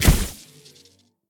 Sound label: Lightning Tank Footstep; AudioWizards